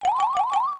infatuated; pokerogue; battle anims

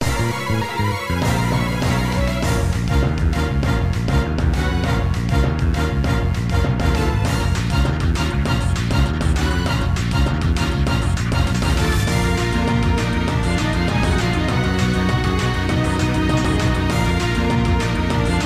battle aqua magma grunt; bgm; pokerogue

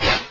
Fury Swipes; pokerogue; battle anims

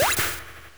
faint; pokerogue

